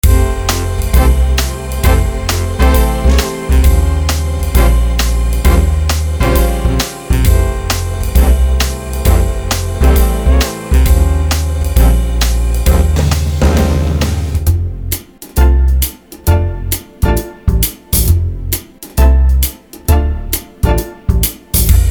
swing era

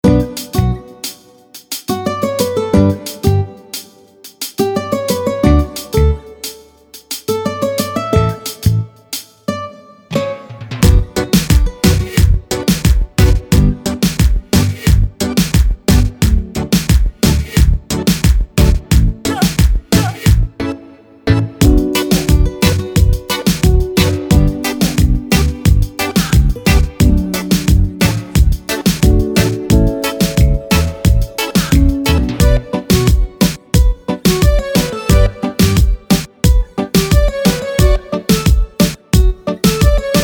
raggaeton

